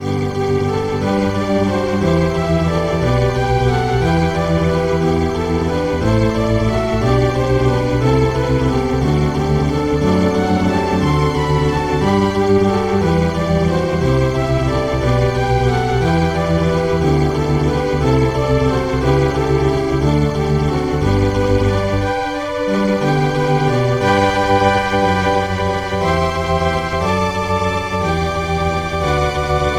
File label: sweep pad